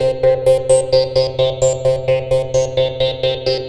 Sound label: MODUORG C, Modular Synth Loops; Polyend; Simulacrum Sounds